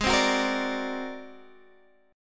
Ab 9th Flat 5th Ab down Guitar Drop D

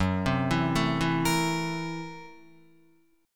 Gb 7th Sharp 9th Flat 5th Gb Guitar; arpeggiated; Gb7#9b5; JGuitar

